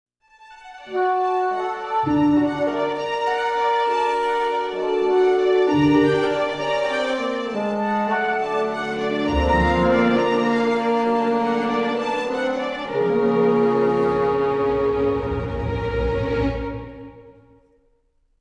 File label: Balloon Float Down; NIS